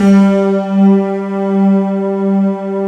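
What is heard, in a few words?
stacked G3, piano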